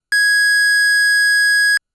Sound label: Square G#5, keys; fleet keys Samples